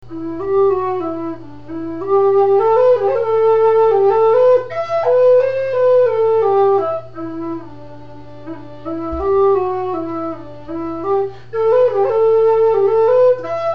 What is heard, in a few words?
low whistle; tunes